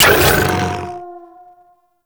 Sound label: Quad Sniper Mech; Warframe; Weapons; Tenno